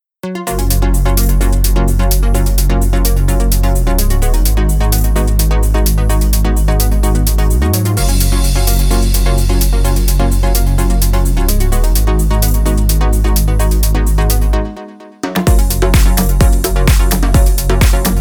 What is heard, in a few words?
easy vibes